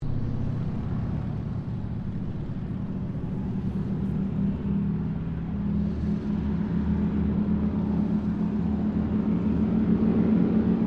AIRCRAFT TROJAN SQUADRON 2 TAKEOFF; Mixed aircraft squadrons; Airplanes (Sound Effects:Vehicles)

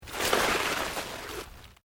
Plant Leaves Large Move Slow

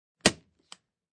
Ski Binding, Ski binding snaps; Skiing and Snowboarding; Sports

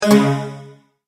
multimedia tone error

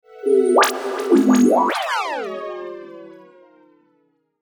Resonance Blurp; Ascending; Blastwave

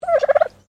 Bird Turkey Domestic Call

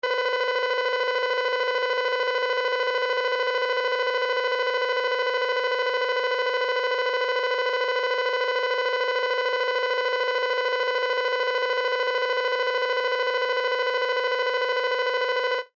Science Fiction Computer Readout Single Tone; Computers

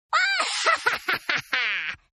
Laugh Witch, Evil Laughs; Laugh Wiitch 2; Evil Laugh Sound Effects; Horror

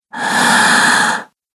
Female Breath Inhale; Gasping Sound Effects

